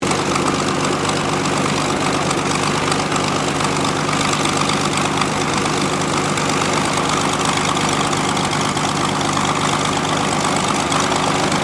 Aircraft P-40 Kittyhawk idle, WW2 Kittyhawk or Warhawk fighter plane; 1940s; Airplanes; Vehicles